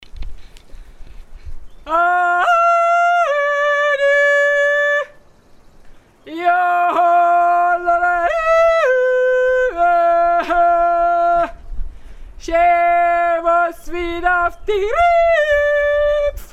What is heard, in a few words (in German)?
almschroa